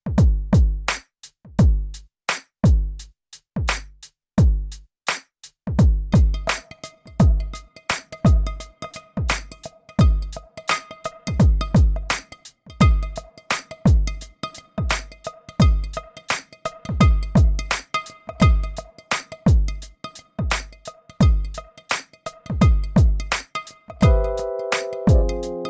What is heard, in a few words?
Instrumental Freestyle